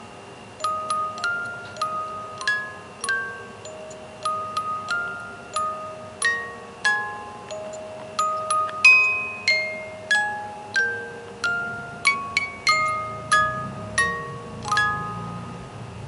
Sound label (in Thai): กล่องดนตรีเพลง Happy Birthday